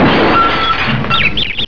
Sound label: Chrash Tong Chip; psion cd vol1